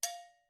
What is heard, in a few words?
agogo Bell ff, Struck Idiophones; Agogo Bells